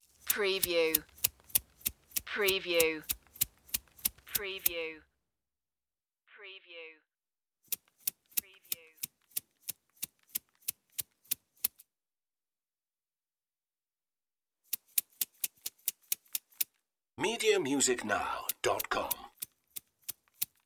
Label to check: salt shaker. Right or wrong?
Right